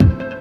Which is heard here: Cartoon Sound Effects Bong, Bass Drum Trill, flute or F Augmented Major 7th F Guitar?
Bass Drum Trill